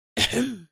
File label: throat short